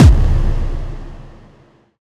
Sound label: modern cinematic impact percussion; BluezoneCorp